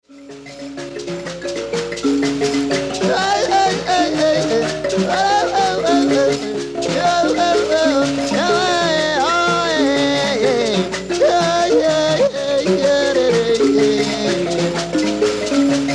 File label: mbira